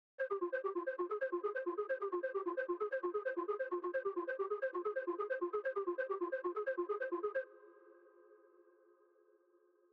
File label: 132 BPM F Min Synth Arp; Melody; Loop Stems; Cymatics; 2022 Melody Collection Platinum Expansion; Spacecraft